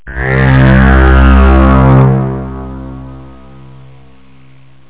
PAD

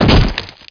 sound land hard; MOTS

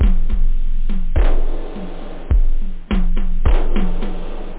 symbolic, loop techno; da capo vol1

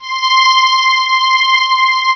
Synth String C6; Star Trek JR Audio

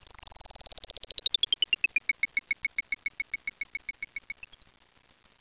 click

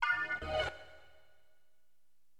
STAB, AcidJazz Funk Fx; LiveAct Pool 1

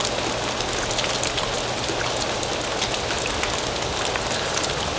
ran loop; DAoC

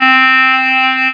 Clarinet C4; Star Trek JR Audio

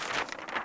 page turn; thief

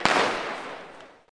Start; wesole przedszkole bolka i lolka; olimpiada letnia

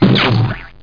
Plop; How Many Bugs in a Box

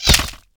arrow flesh

